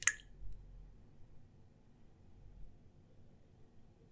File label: leaking washbasin, 洗面所の水漏れ; 洗面所の水漏れ２; wetarea